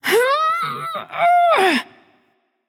grunt lift light; smite; voicepack; hel

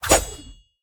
scorpion attack spear; smite; npc

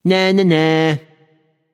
tongue; smite; voicepack; osiris

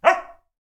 corgi bark; smite; god daji skin15

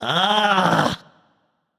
nv ugh; smite; voicepack; ganesha

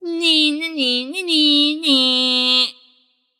nv tongue; smite; voicepack; morgan le fay

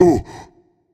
surprised; smite; anhur

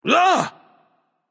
nv surprised, vox; smite; voicepack; vulcan

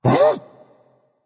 confused, berserker; cuchulainn

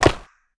skateboard land hard; smite; osiris

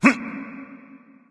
grunt; smite; sobek

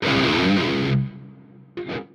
spin guitar; smite; chaac

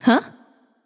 Confused; smite; themorrigan

